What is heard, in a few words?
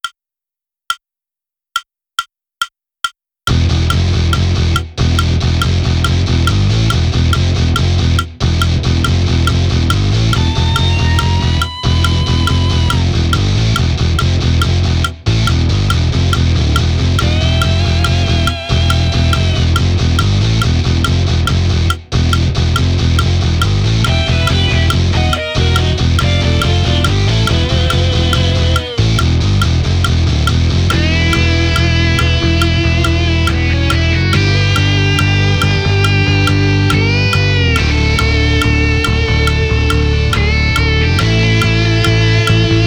Rock Groove Loop drumless